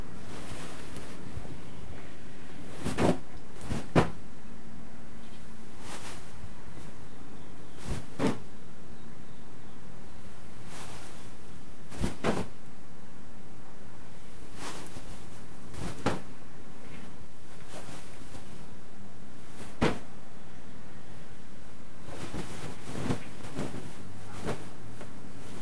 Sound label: Pillow Throw